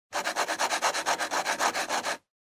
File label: Ballpoint Pen Fill